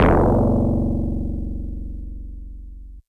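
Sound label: Easy Clav DX100 F#3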